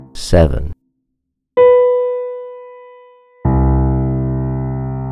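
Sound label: 7th B3 Piano Key C; designated degree ear training; key to your kingdom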